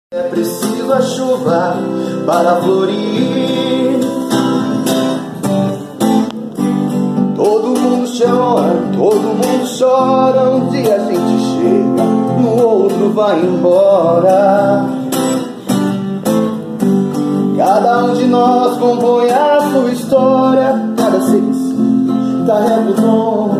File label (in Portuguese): MUSICA CANTO DE VIOLÃO